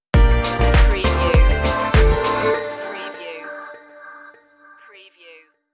funky poptronic stinger

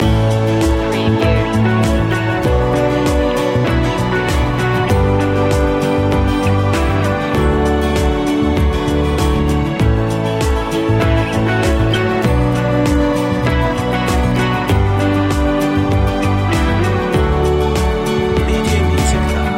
dreamful loop

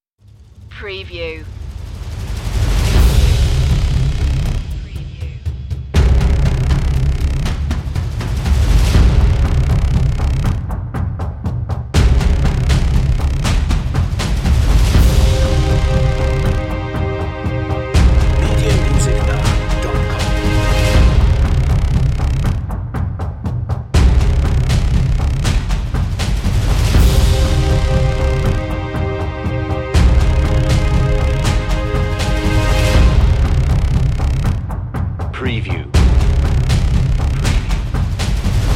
arise no strings

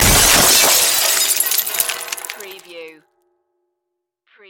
Crash Glass Wood Metal

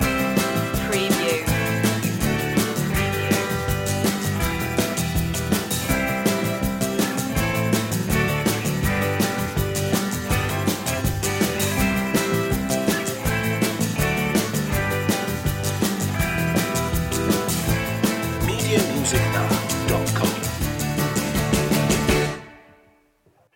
upbeatful loop

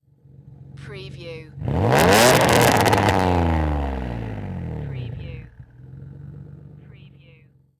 NISSAN 350Z EXHAUST SINGLE REV

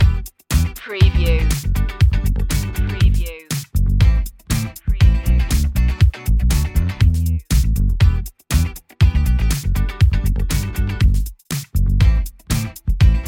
french disco loop

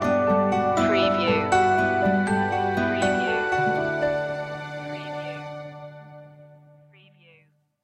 summer adventures stinger

